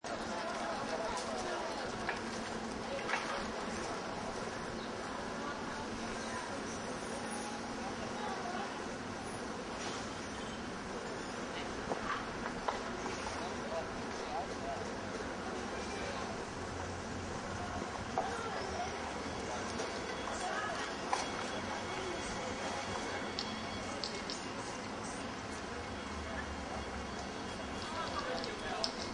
Small Town Sound, Small town outdoor ambient sound. Pedestrian area, very light crowd traffic; Adults and children talking in distance and walking by; City; Lynne Music